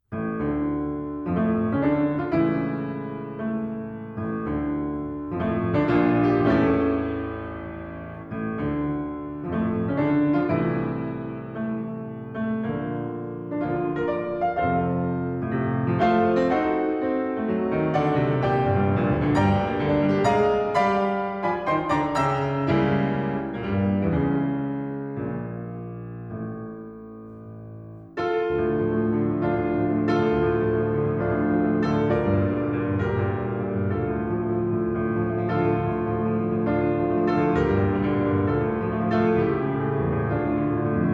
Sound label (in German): Sonate d-Moll Allegro; Emilie Mayer